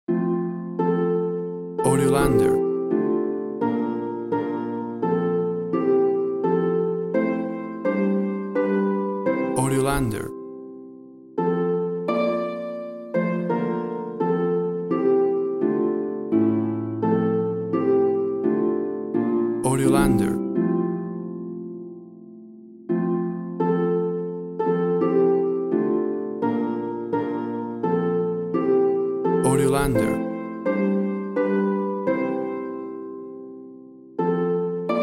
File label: While Sheperds Watched Their Flocks By Night Harp